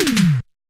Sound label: Samsung S21 80's Tom Notification